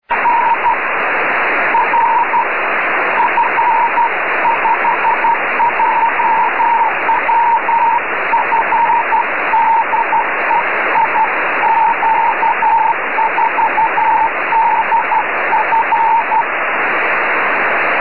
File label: EME 10GHz, CW; G4NNS